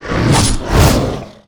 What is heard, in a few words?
attack; NarsElementalKnight